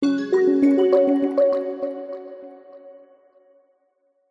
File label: alert dreamy soft warm drippy delayed bells finish end